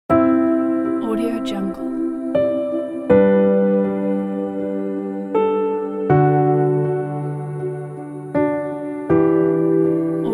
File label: sad piano